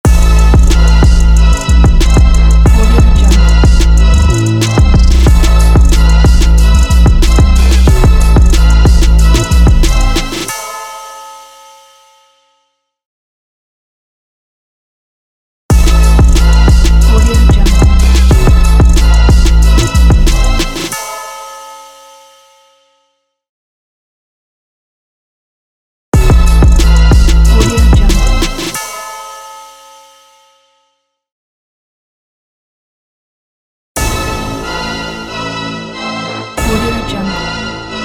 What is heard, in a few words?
epic sporting trap logo